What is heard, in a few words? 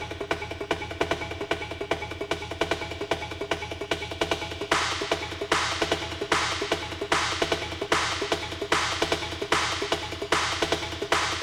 Drop The Beat